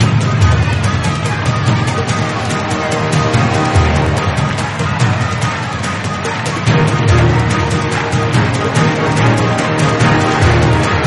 music loop

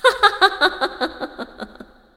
laugh, zh; Anivia

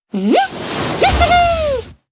Burning Fire Spirit

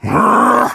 hurt vo

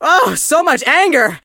hurt voice; chester

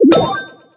turret

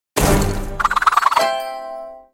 Miner Shovel Flowers

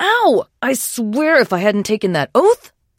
trader pain respond; 七日杀; 商人杰恩

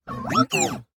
positive beep; 堡垒（守望先锋2）

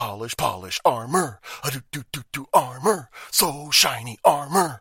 trader hum

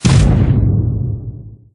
ram charge hit

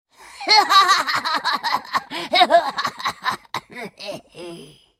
laugh

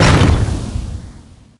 hit; Frank

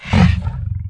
Hippogryph what

